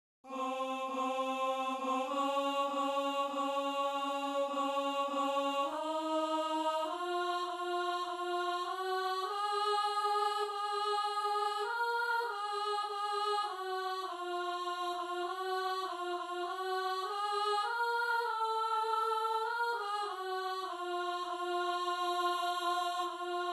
in monte oliveti contralto

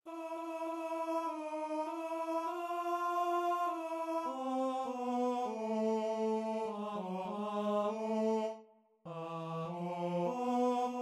adoramus te tenor

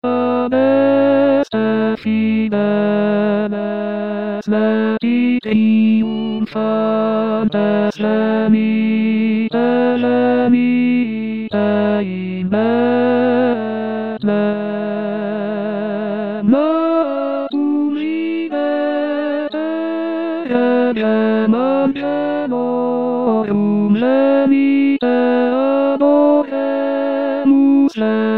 adeste fideles tenor

